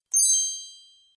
Fairy Chime Spell, magic; Magic Sound Effects

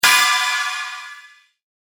Clang Ghostly Metal Hit; Hit Sound Effects; horror; Andrew Fleming

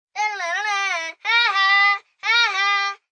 Taunt Haha, Attitude, 1 boy 7 yrs

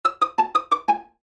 Pizzicato Strings Accent Short Chromatic Descend; Cartoon; Musical

